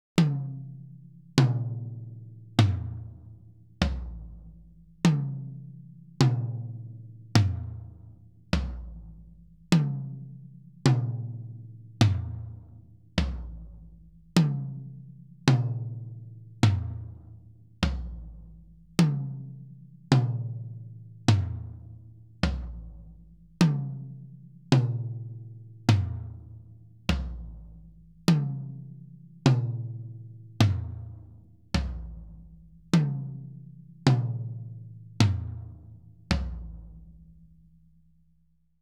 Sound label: Instrumental, Toms